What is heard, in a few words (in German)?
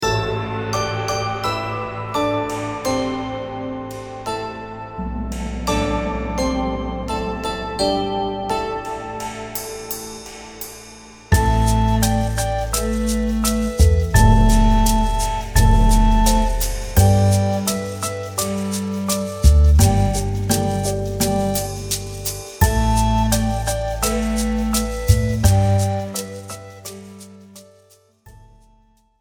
Okina taiko (Mitspielversion), Floetentoene; Meine ersten Floetentoene